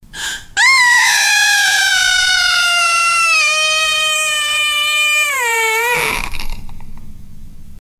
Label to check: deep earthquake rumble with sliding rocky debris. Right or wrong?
Wrong